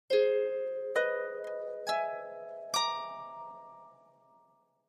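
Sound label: Harp Slow Arpeggio; strings harp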